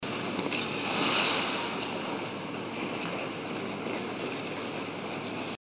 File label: extreme close up skating